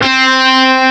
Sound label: sd90 classical distortion guitar high; tumbledemerald